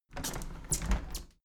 window handle plastic squeak close tilt regular; objekte; fenster

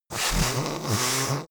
yeti nose blow strong

